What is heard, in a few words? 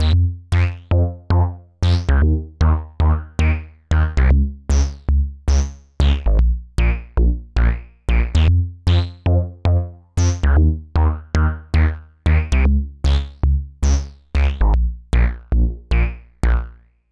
Model 15 Bass